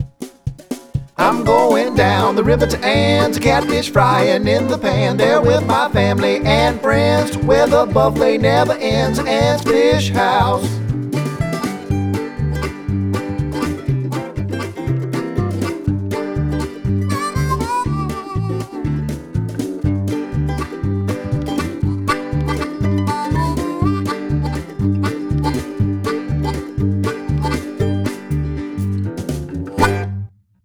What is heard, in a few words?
JINGLE Sing In; WKXY; ANNS FISH HOUSE